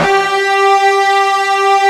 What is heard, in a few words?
FUZZ G 3, Guitar; ULT FZZ LOOP; East West; Steve Stevens Guitar Samples Collection